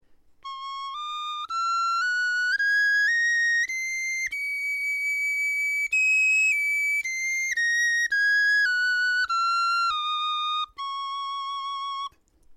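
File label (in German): tonleiter, Knochenflöten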